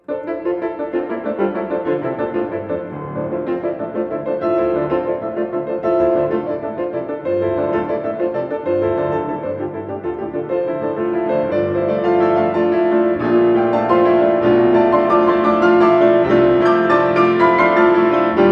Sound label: Etude Tableau d-moll Allegro moderato